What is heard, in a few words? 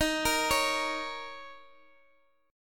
A sharp Minor Double Flat 5th, D sharp